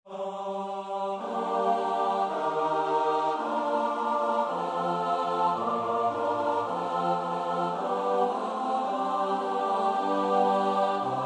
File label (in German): Sein Blut komme über uns (S-A-T-B); Schütz (Heinrich); Matthäus Passion SWV 479